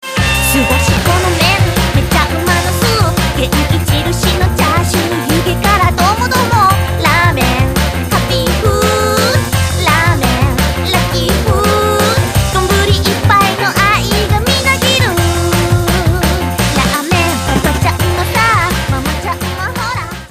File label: ramen rock